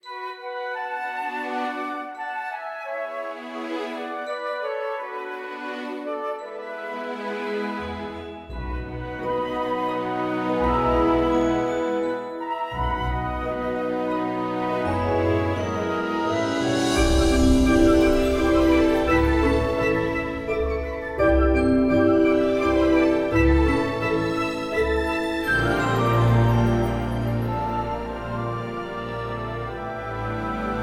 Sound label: main Bgm; b23 zuma